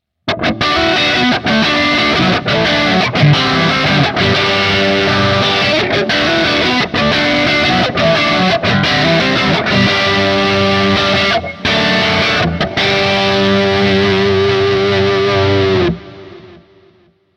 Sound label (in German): Distortion Rhythm Halspickup